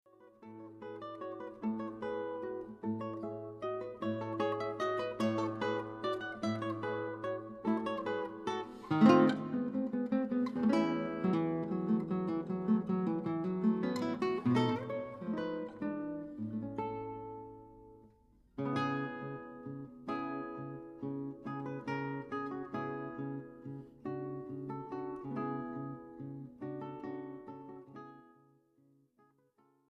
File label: mazurka, guitar